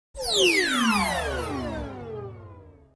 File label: Multi-Pitched Synth Laser Burst Descending; Electronic Sci-fi